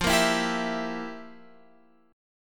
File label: F Major 7th Suspended 2nd Suspended 4th Guitar Open F, chord; strummed